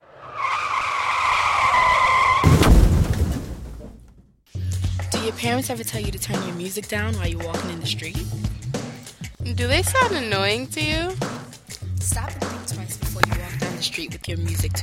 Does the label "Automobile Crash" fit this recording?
Yes